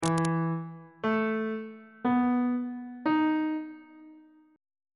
d double sharp major 7th suspended 4th chord intervals